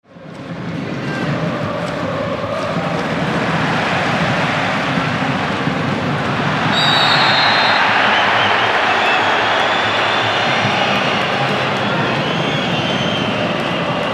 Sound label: soccer crowd after a foul